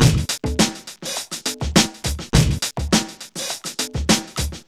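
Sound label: 103 bpm, Funky loops; Zero-G; Funky Elements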